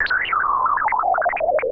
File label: SPACE FX 140; SpaceSounds